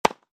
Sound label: Tennis Volley; Sports; iLife Sound Effects